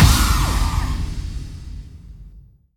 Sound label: FX Kicks; Effects; Vengeance; Essential Clubsounds Vol.4